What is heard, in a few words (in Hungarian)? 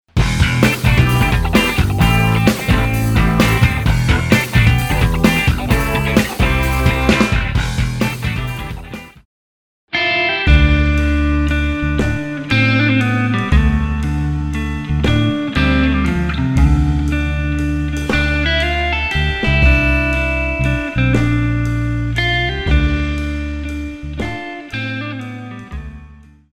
metrum